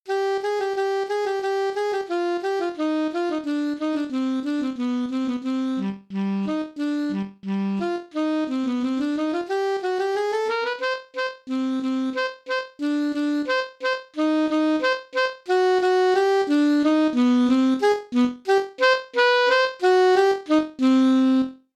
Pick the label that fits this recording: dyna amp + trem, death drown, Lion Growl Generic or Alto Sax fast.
Alto Sax fast